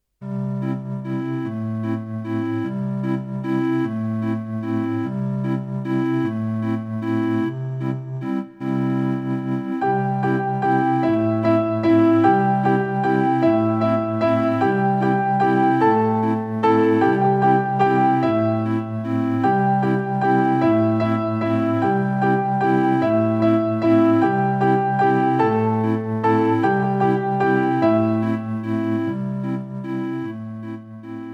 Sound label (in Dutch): zonder zang